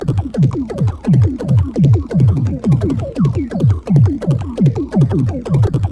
BLEEPY BLOOPS; Zero G; Malice In Wonderland

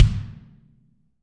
BD, Real Mega Drums

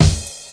drum, EMU Proteus; Proteus1

